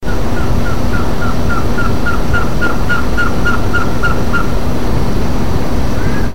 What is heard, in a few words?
Trogon s aurantius; PN Itatiaia; A Silveira